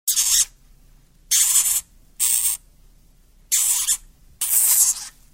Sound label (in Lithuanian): Sirinis ziurkenas